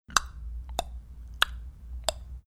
making mouth tick tock sounds

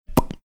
making mouth popping sound

